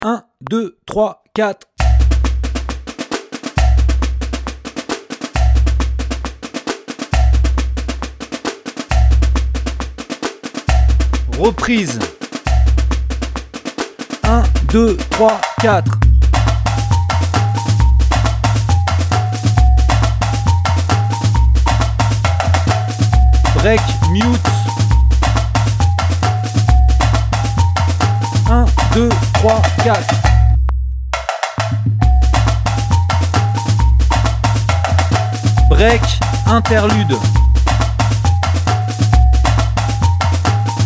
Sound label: funk 150, sans repik